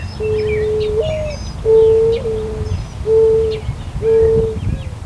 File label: mourning dove; natureNavigator